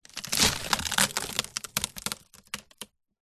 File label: wood split crack break splinter